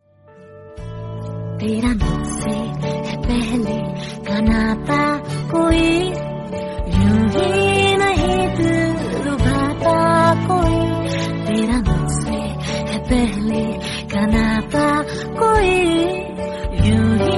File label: hindi love song